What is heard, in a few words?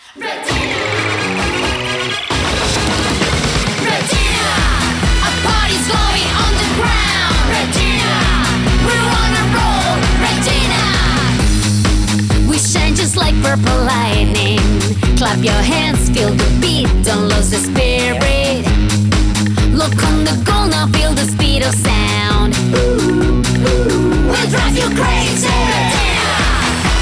BGM; OP